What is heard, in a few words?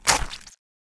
head shoot